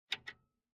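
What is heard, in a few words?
Mechanical Switch Oscillator Switch Contact Mic; DolphinEar; SanojSounds; Essentials Vol.1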